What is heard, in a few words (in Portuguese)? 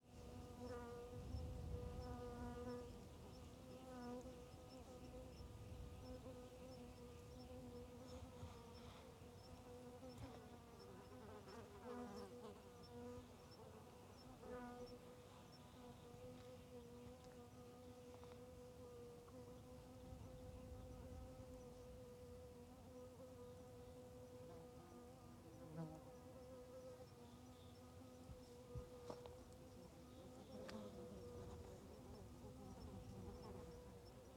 Varias moscas Rodeando o Microfone; Trilha do Sertao Zen; insetos